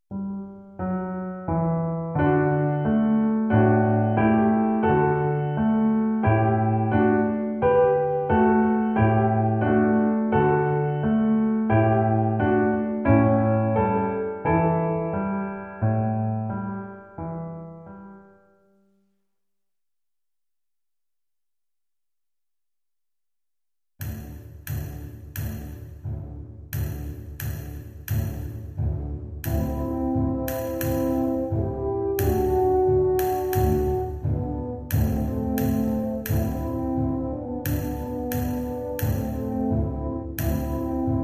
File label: Piano